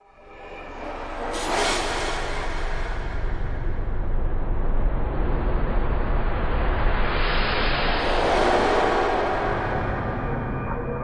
Keeper Of Lost Souls Ambience

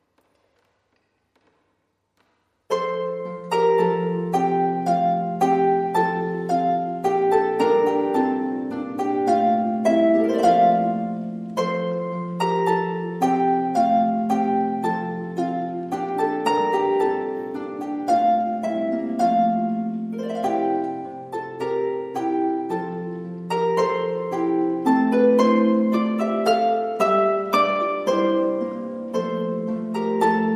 A Harp Deck The Halls, No Church Organ Mix

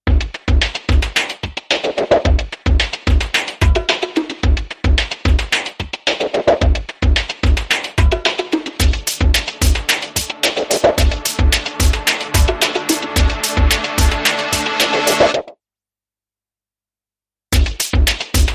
Beat

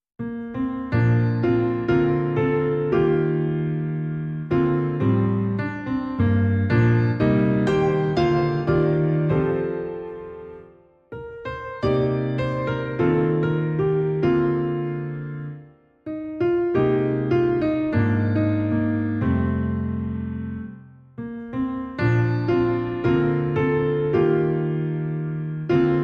Solo Piano

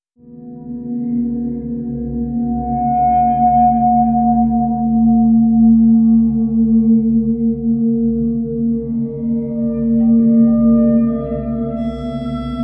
Suspense, No Banjo

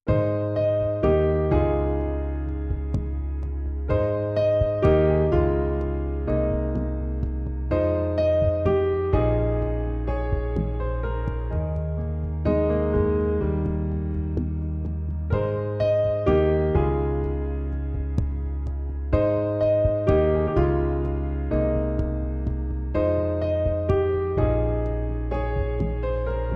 Piano Theme; 60sec

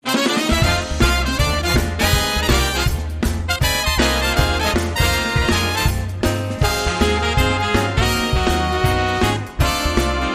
Shuffle Brass

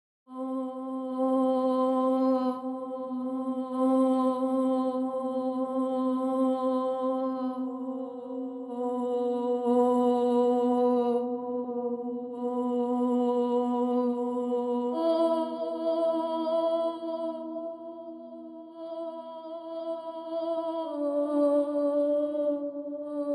Voices Only

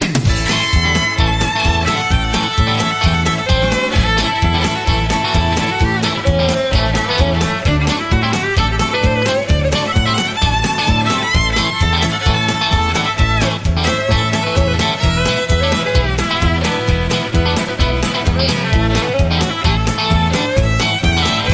Country Pickin

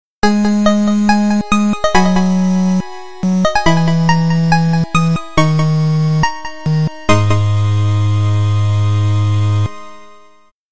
Water Labyrinth Jingle

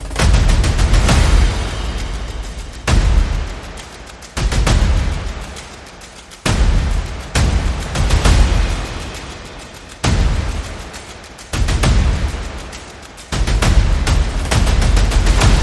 Percussion and Effects

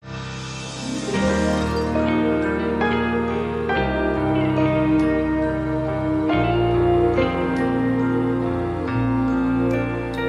Rock a bye Baby

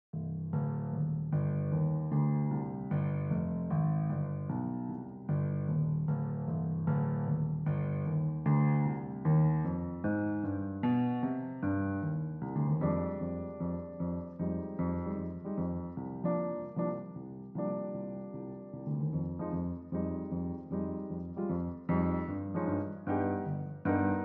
Piano

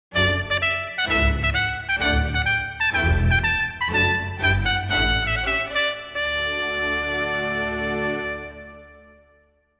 Trumpet Sting

